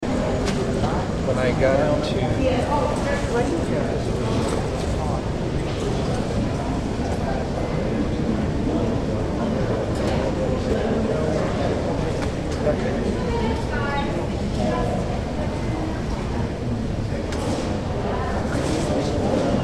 atmo airport terminal background; john wayne airport

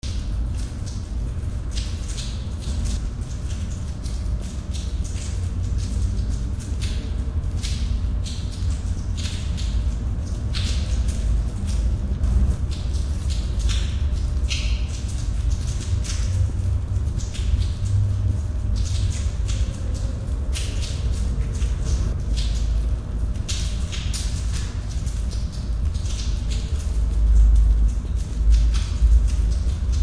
atmo underworld floor splashes

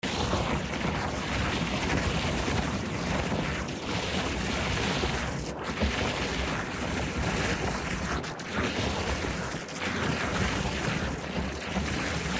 water rapid water jets

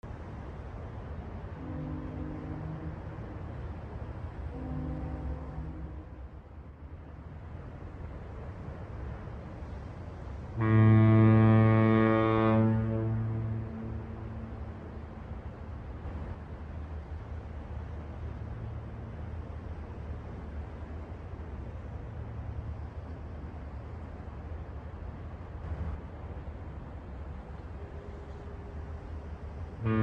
harbor close and distant fog horns

